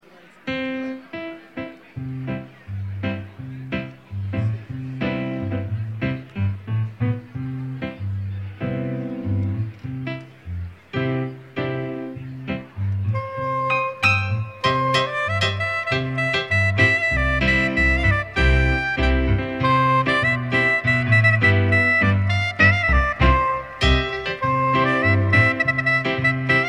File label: Wedding March Set; Engagement Party 2013